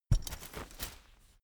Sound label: umbrella big close full hard